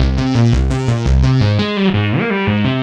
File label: Carry Mod Mix B 170 C, modular 170bpm; modular madness